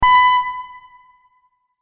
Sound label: Multimedia Event Notification, beep sound effect; Andrew Fleming